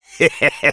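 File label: laugh short, French; Sniper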